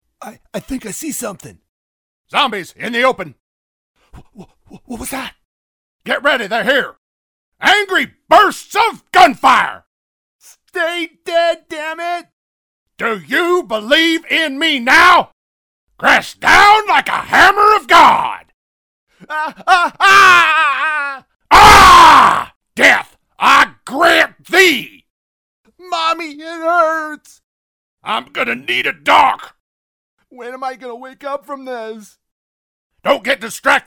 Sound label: Zombie sampler